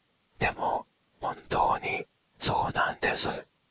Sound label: Vib synth